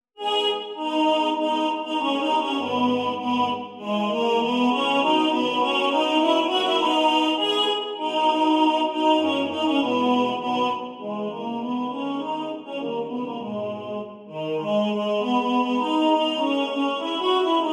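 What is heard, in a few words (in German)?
Tenor2 im Vordergrund, Männerchor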